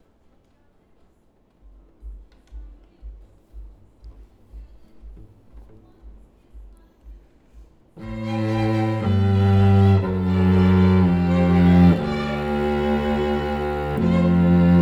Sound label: Cello